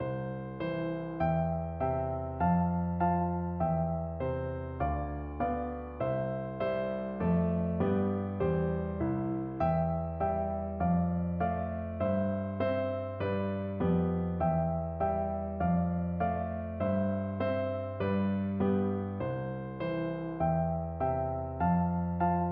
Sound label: twinkle twinkle piano duet